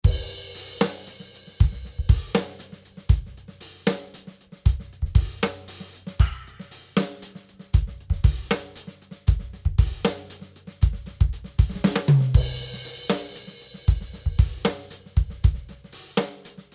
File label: Pork Pie Cherry Bubinga Snare